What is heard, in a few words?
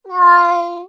Cat Domestic Meow Sad